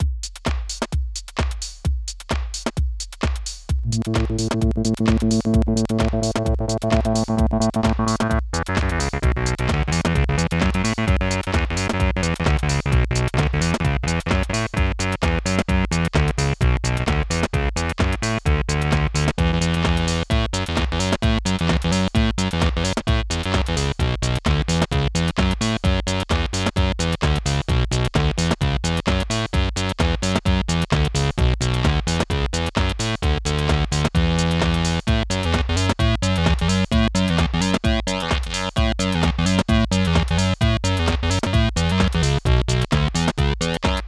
Dubreq Stylophone S2 Jam und Beat

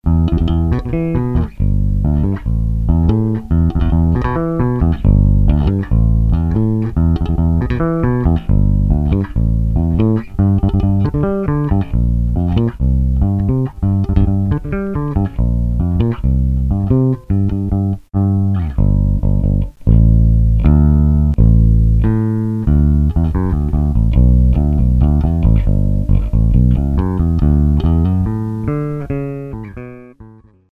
Sterling Ray35 vorne

